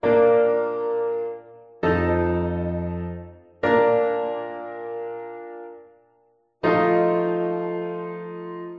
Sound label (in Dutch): vierstemmige zetting; verdubbeling in onvolledige akkoorden